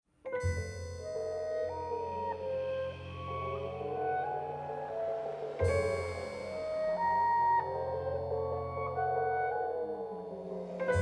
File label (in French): echo intrumental; Orphée aux animaux